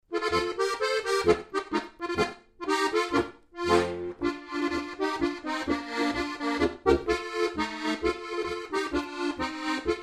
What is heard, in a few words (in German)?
polka stimmungspotpourri